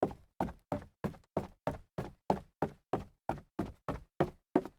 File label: stairs, foley